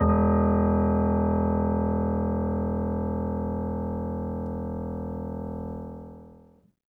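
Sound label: Acoustic Piano, Left; InVision Interactive; Miroslav Vitous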